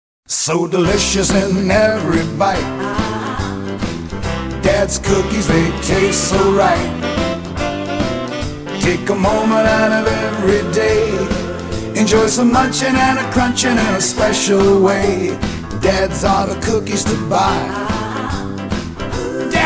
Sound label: Jingle